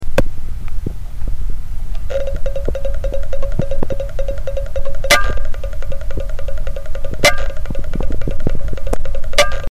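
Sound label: samsung dead pcb, hard drive; Почему у специалиста по восстановлению данных должен быть хороший слух?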